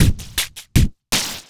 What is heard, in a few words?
Electronica Drumloop; Drums